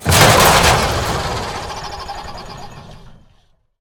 Mulchmuncher Death

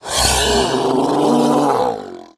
Death Sound

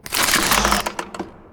Octobot Underlay Death

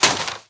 Bowl Scroll Poke

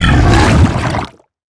Attack

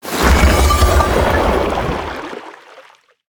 Submerged Spacerock Attack

